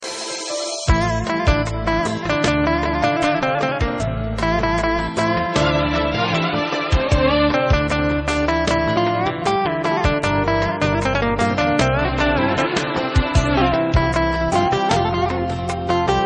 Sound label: Armenian ringtone